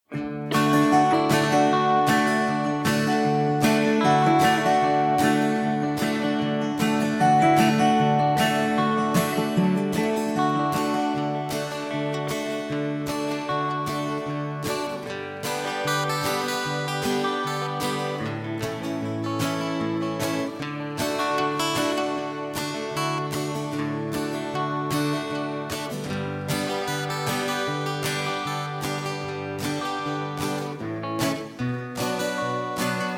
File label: (D) No Backing Vocals